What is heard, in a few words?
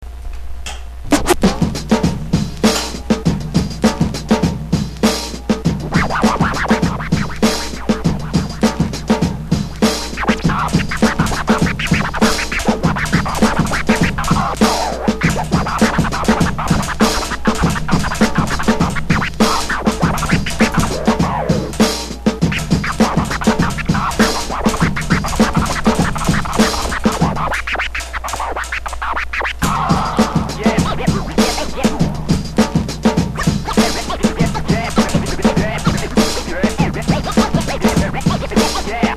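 scratch break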